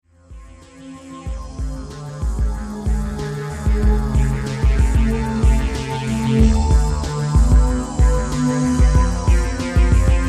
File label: synth